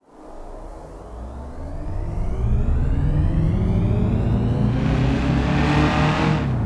first, vehicles; gmod; tdmcars; prius

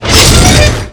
heavy crash, vehicles

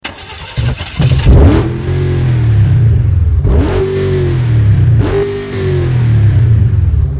shelby start loop; vehicles

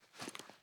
reload lower; reloads; gmod; iw8

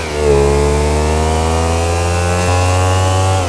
third, vehicles; gmod; tdmcars; gtav; bati

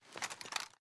reload empty rotate; gmod

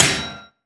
frag physics bounce metalgrate hard; gmod; viper

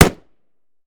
mike4 fire last, weapons; gmod; viper

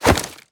melee attack gun; viper

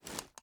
reload empty scope fast rattle; reloads; iw8; uzulu; gmod

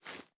sm uzulu reload empty xxmag arm; reloads; iw8; gmod

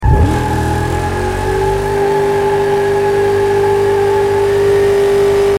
shelby first gear rev loop; vehicles

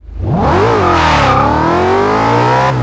first, vehicles; gmod; tdmcars

